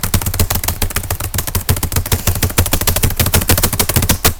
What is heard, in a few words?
Typing